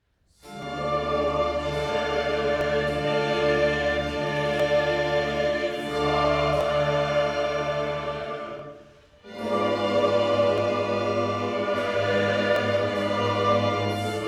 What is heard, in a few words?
chorale; magnificat vivaldi